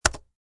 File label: keytyping 4; mintty